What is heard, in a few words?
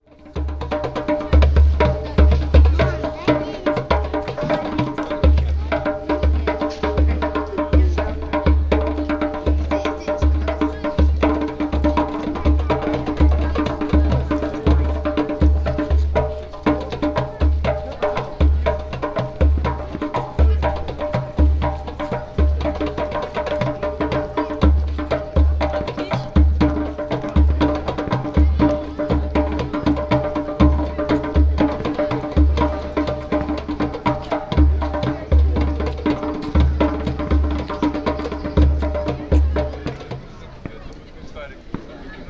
drummer; krasnoyarmii avenue; sergiyev posad